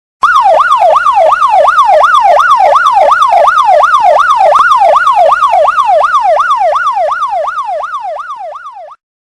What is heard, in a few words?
police hooter police siren